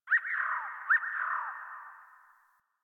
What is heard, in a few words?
Birds Low, Ambience, Plains, Landscape; One Offs; Warframe; Eidolon Plains